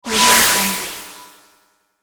magic shield

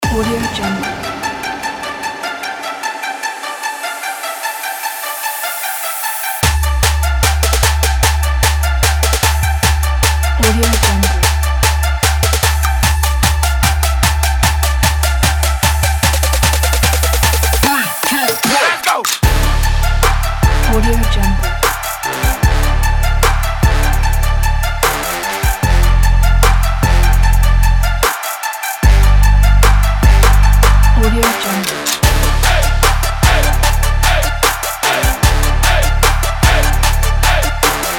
the workout; cymatics-media

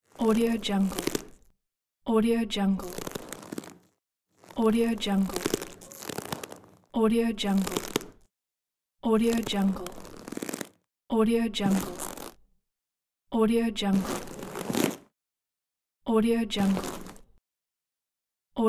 leather shoe

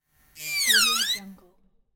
chop saw quick cut

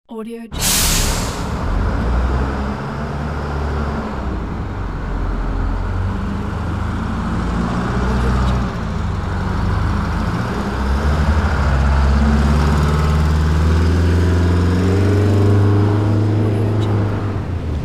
bus

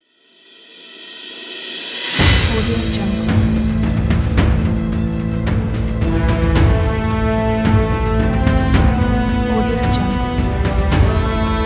action cinematic trailer

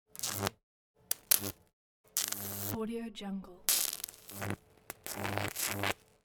electricity

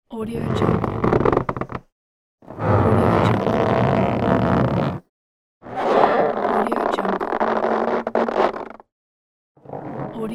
squeeze and stretch balloons pack

